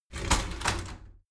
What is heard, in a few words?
shower slide door inside open heavy halfway